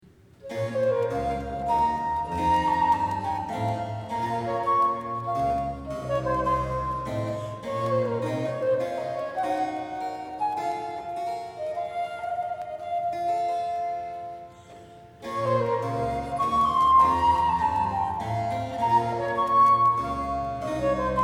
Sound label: Sonata for flute in b minor - Gavotta; Alexander Munro (1796-1773)